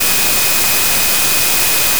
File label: additive voice single; euclidean; FFTimbre